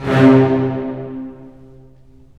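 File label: STR, strings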